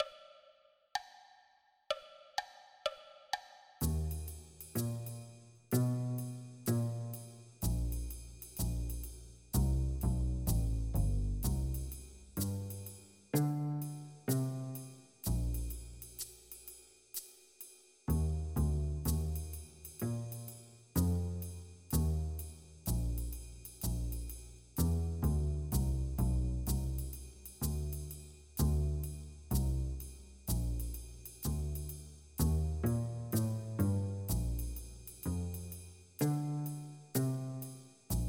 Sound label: Bb 126bpm